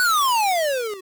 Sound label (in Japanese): 落ちる音(電子音)